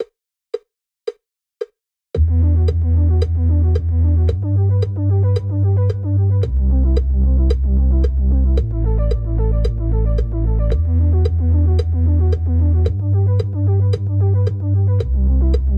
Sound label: Wide Water with click 112bpm